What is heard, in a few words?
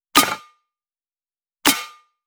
snare 260 bpm